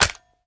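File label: pistol reload mag in; gmod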